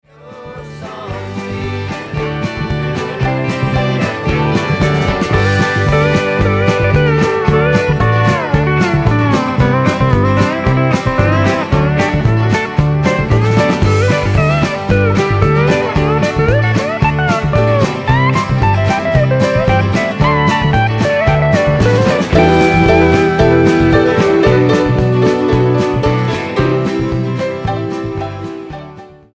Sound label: Blue House Steel Riff